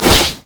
mage chains; LoL; ironforge xerath

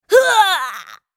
Tristana dying

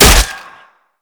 basicattacks; Zyra

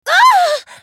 dying

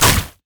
grasping roots hold; Zyra; LoL

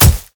basicattack; LoL; irelia

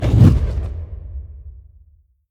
summoner spell base ignite; LoL

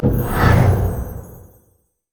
tumblefade; LoL; vayne

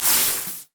bandage toss; LoL; badrobotamumu

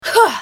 effort; JannaForecast

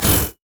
riposte; LoL; fiora

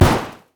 micro rockets; LoL; heimerdinger

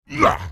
effort; Sion; en US

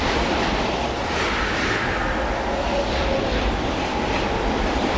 spectral loop; LoL; yorick

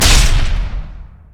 dragon strike hit; LoL; jarvaniv

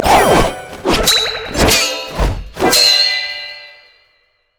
emote recall; Vi; racer

